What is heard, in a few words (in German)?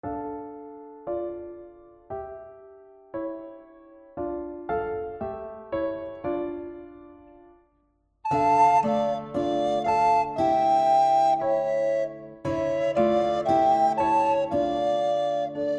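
Tambourinet, Flötentöne; Jede Menge Floetentoene Band 3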